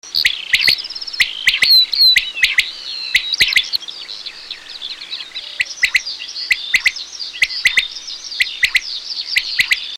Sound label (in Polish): przepiórka, ptaki; Przyroda ptaki i zwierzęta